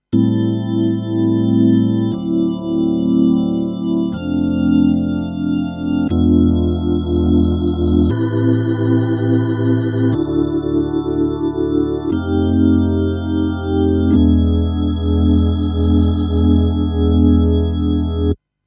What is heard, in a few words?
E Organ; EHX Lester K